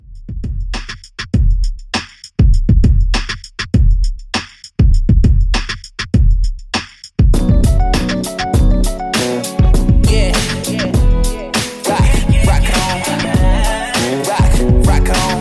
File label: Redrum 100Bpm; djyan